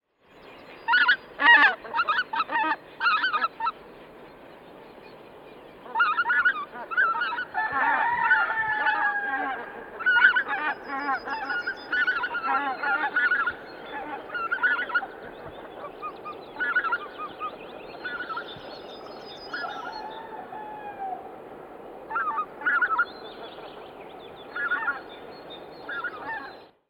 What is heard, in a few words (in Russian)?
belolobyy gus golos